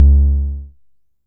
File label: Lo Muff, Percussion Hits; Zero-G; Soundclash; Acidized Wavs